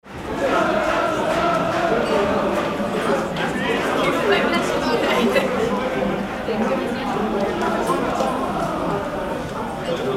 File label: railway station singing soccer fans